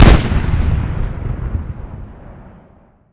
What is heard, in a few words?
bmp 30mm